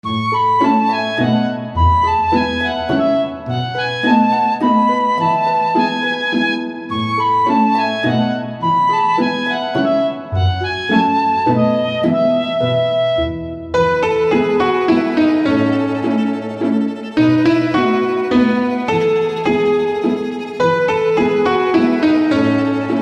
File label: karaoke